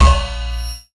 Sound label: Percussive Hit, contact mic